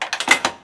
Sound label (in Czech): pumpa; Mafie 1